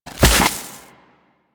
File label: Ice Proj Fire; Enemies; Abilities; Warframe; Corpus; Arachnoid Camper Terra